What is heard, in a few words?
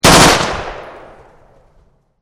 M16 short burst, Assault Rifle; VRSonic